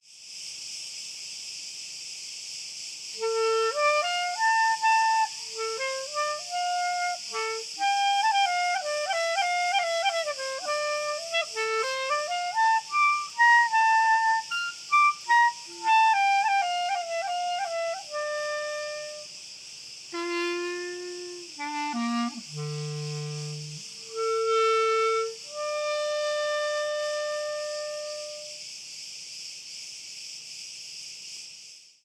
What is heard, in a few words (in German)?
Grillen Stand Tief